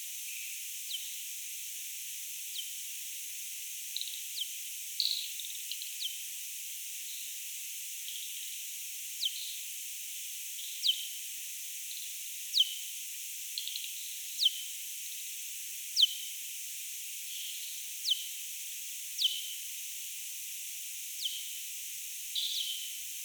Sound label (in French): abs moy M blythii; Herault; M-Barataud; Acoustic Ecology of European Chiroptera